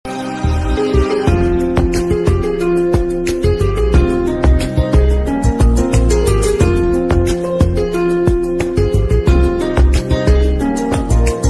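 trending background music ringtone love background music ringtone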